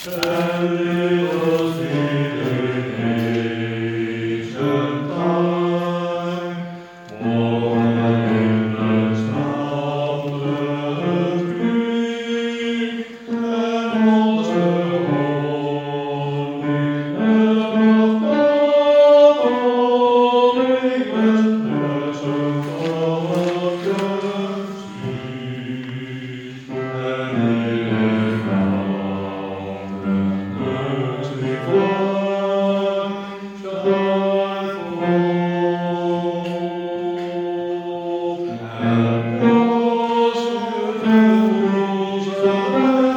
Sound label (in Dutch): Jerusalem Bas